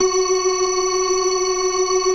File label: FAST VIB, TREMELO+VIB, Synth and Organ; InVision Interactive; Keith Emerson